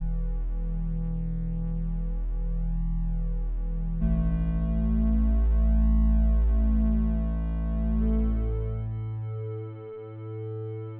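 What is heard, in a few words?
with drums synth conv 250ms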